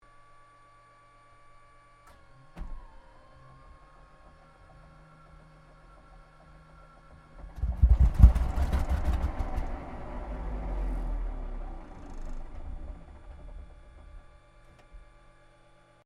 Aircraft P-40 Kittyhawk Startup miss Fire Interior, WW2 Kittyhawk or Warhawk fighter; 1940s; plane; Airplanes